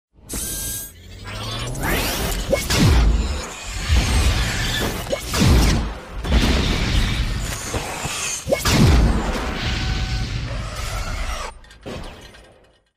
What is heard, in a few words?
Plasma Caster; Avp